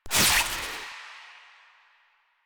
Jade Scythe Spin; Weapons; Stalker; Warframe

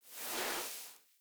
Foley Idle Stand; Enemies; Kubrow; Warframe

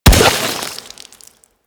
Chem Strike Nox Rifle Projectile Explode

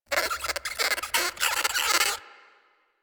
Forest Rodent Squak; Ambience; Warframe; Grineer Forest

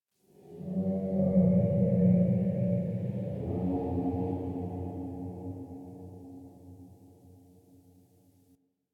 Metal Groan, Ambience, Locker Room; Warframe

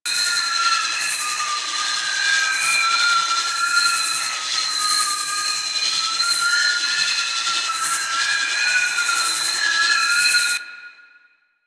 Fluid Sac Trail Search Loop; Warframe; Infested Eris; Encounters

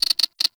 Insect; Ambience

